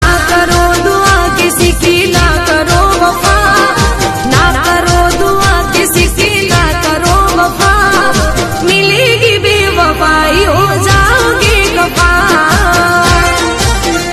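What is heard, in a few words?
gujarati ringtone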